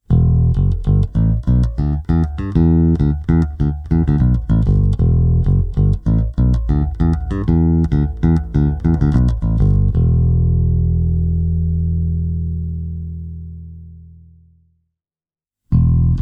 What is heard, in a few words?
through ashdown combo, electric bass; harjunp vikingman